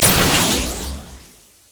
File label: accel; LoL; jayce